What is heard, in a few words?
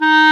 WND CLAR, Clarinet Sh